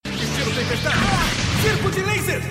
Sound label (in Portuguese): estilo tempestade circo de laser; laser circus